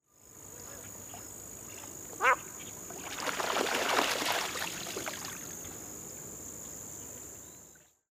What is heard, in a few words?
Hooded Merganser Lophodytes cucullatus; waterfowl